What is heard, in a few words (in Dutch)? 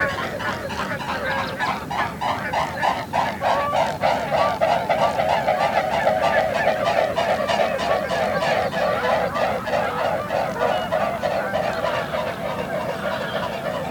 Knobbelzwaan Cygnus olor